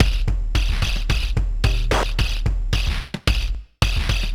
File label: Bent Korg MR-16 (110BPM), cyborg beats